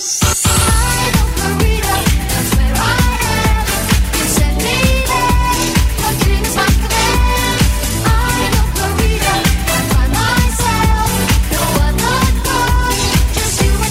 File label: ringtone